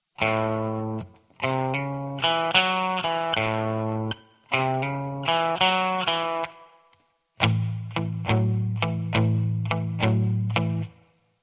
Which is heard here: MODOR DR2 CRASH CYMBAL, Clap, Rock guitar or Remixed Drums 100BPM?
Rock guitar